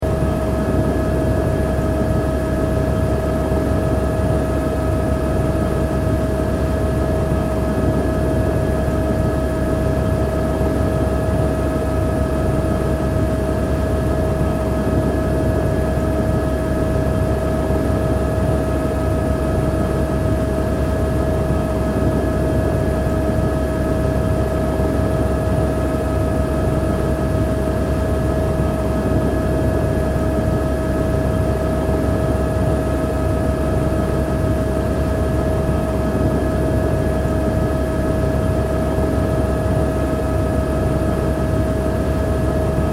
Helicopter Flying Idle Loop, Helicopter interior ambience; during flight. Seamlessly looping sound.; Helicopter Sound Effects